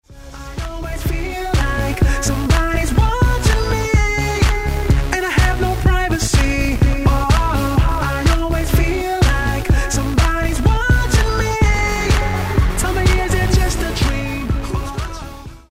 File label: chorus, Ringtones